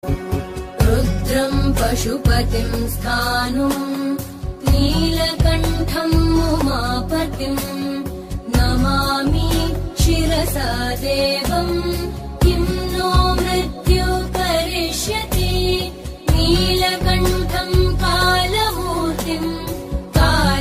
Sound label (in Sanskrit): Mrityunjaya Stotram